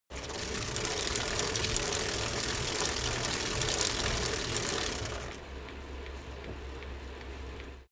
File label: bicycle rickety pedal roll short